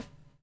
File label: BUBL, BUBBLE; Spectrasonics - Backbeat